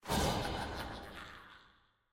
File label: fanfair appear; shaco